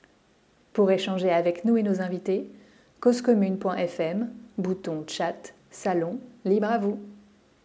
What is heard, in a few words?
jingle